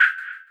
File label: Oneshot